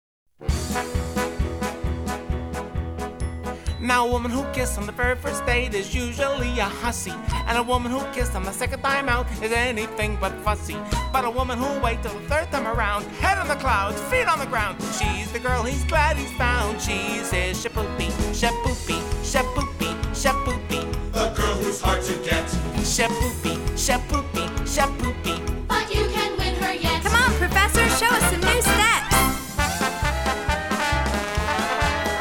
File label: W Vocals